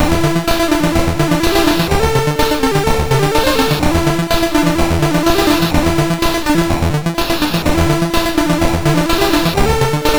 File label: Synth Sample III, MOS6581R2